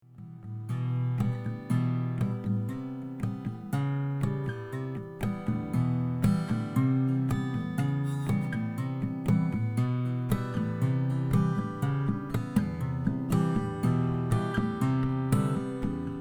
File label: taylor 612ce red finish over maple